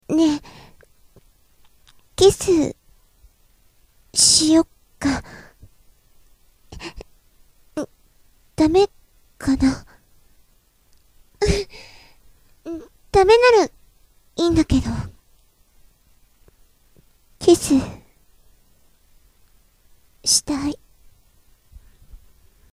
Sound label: kiss